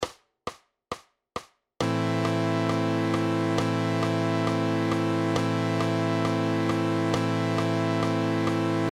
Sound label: backing track, a minor scale